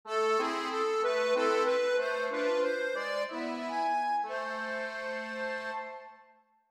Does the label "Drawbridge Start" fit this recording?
No